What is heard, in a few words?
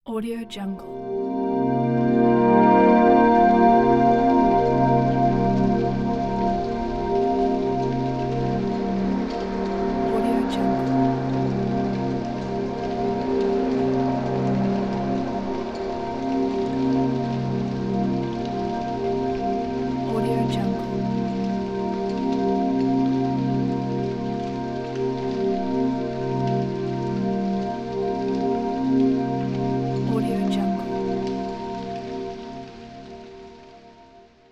haunted forest ambiences